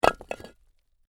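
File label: Brick Impact Or Cinder Drop; Stone slab, brick or cynder drop to the ground and settle.; Rock Sound Effects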